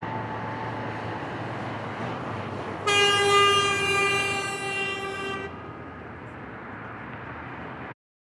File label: Car Horn Short Passage With Road Traffic